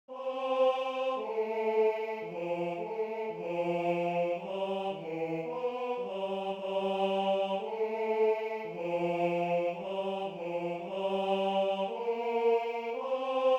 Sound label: Game Ending Song - Tenor